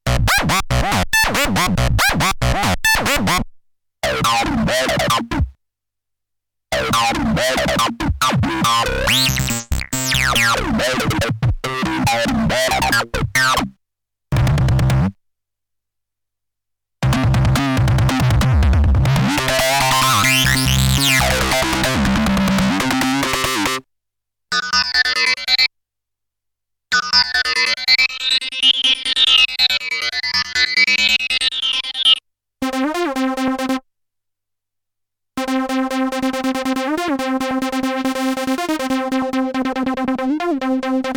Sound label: Lead